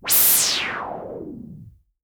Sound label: SH-09 Noise